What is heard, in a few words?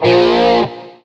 Powerchord